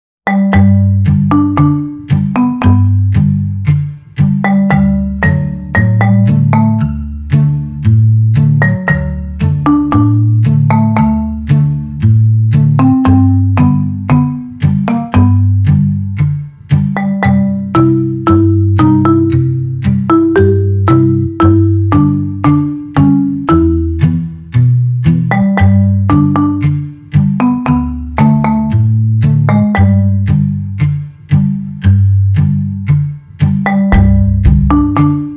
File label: Mari Mba, folk music, 115 bpm